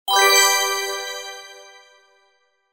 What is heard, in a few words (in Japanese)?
kirakira